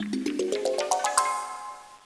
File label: drum